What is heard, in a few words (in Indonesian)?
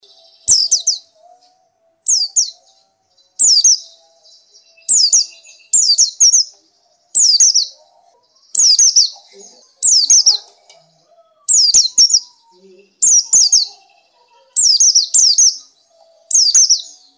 Suara Burung Pleci Gacor Ngerol Panjang